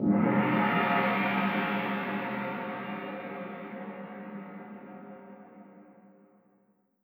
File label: Gong